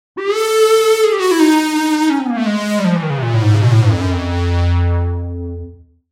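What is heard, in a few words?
Korg Lead; TURBO VL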